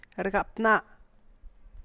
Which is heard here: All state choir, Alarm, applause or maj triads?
applause